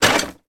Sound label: industrial hand cart bounce down single concrete step with boxes on; sound effects one